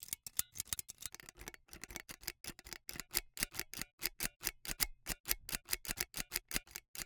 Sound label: Icecream Scoop, metal, mechanical, teeth, gear, squeeze, release, multiple, rapid, various; Eiravaein Works; Vaeyan IV